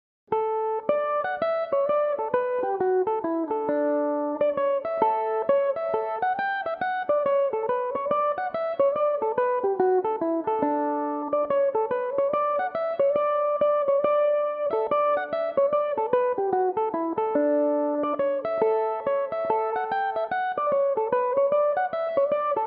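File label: hornpipe, D major, mandolin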